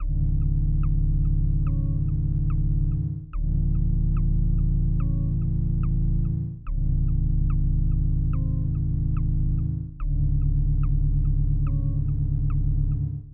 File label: tension drone 72bpm loop